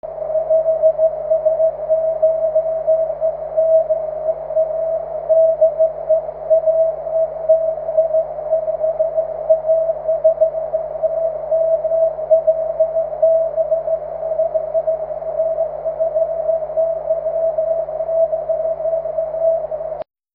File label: cw; 23cm